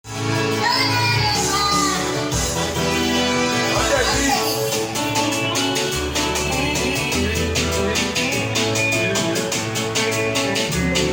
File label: beat hausa